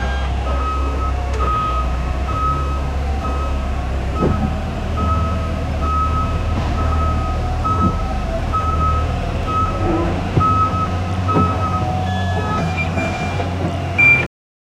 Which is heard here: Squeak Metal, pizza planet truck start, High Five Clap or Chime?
pizza planet truck start